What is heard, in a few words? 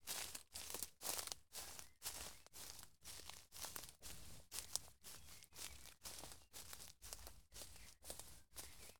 Steps Sneakers On Leaves